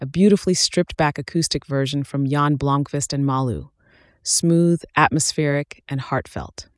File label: Acoustic Version; Indie Q Radio